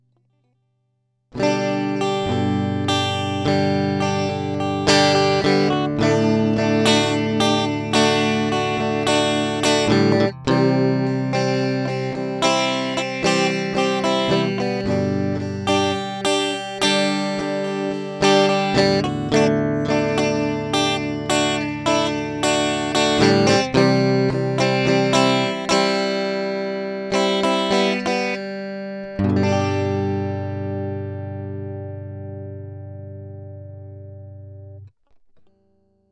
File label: Guitar Direct Air; Focusrite